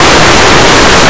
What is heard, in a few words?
Scribble